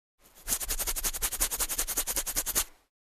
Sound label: Shoe Brushing